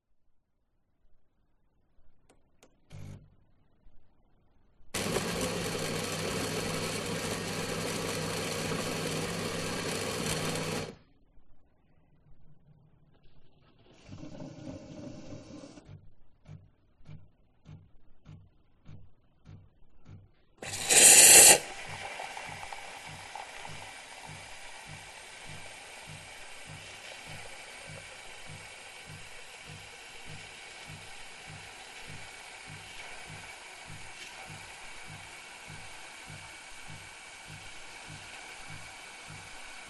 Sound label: Coffee machine making cappuccino; west wolf